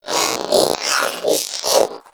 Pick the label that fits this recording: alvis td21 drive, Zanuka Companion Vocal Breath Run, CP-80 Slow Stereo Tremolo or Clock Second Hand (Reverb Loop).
Zanuka Companion Vocal Breath Run